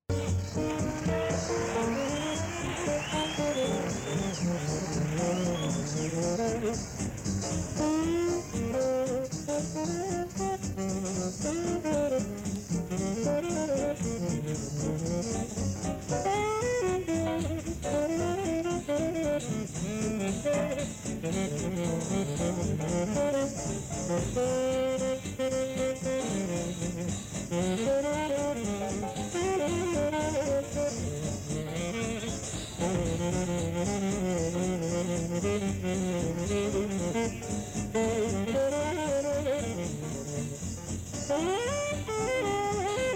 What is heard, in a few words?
Rhythm